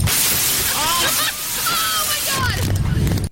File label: static